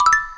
navigation sound; Alcatel; OT-C701

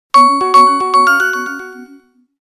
Echo

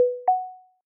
Charger Connection; Samsung Z3; Tizen

